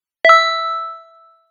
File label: text receive; Sound design for the opera composer: concepts and methods; Vicky and Albert audio cues